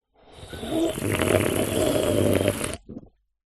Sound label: human drink slurp soda from can single